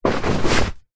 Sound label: foley clothing suit trousers pants pick up